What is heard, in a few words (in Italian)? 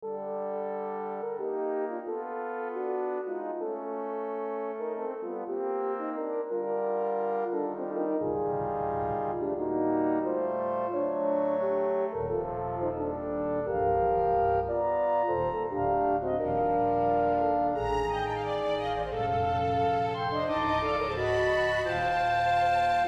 scherzo atematico in b flat major